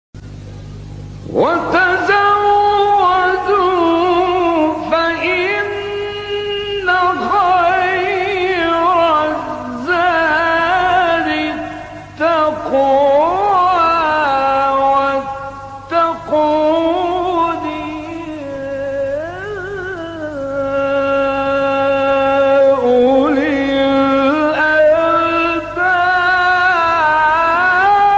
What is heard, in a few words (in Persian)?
فراز زیبای آیه سوره; 197; بقره; غلوش